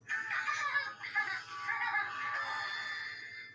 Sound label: cute laugh